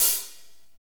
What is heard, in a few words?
HAT, Drumscapes Roland; Northstar - Drumscapes Roland